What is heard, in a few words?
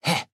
Land